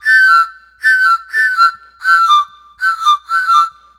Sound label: Thai Pan Pipes Rhythm; Ambient; Clean Flutes